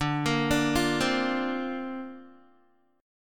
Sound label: D-Minor 7th Sharp 5th D Guitar, chord; Dm7#5 arpeggiated; 10,13,12,10,12,x